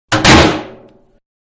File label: Garbage Lid Slammed; Household; Garbage Can-Lid Slamming; Manhattan Production Music